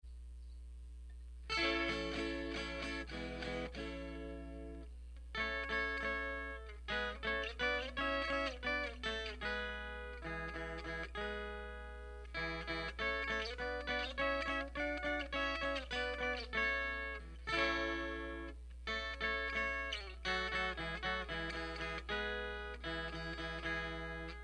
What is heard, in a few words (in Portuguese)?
viola intrumental